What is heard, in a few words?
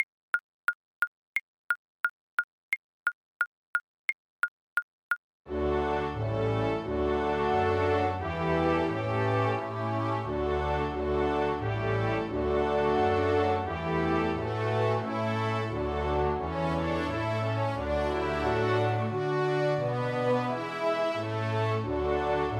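VLC karaoke, Cello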